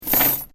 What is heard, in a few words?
Heavy Chain Drop; LYN104 package of Chain sounds; Metal Sound Effects; LynneMusic